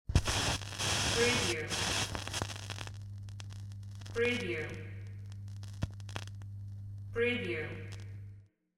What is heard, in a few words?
record needle set down